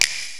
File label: SNAPPS; Rubber Chicken Software Sample Bank; DRUMS + PERC